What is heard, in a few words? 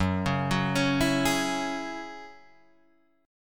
Gb 7th Suspended 2nd Gb Guitar Drop D